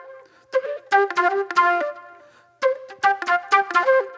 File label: Disco Flute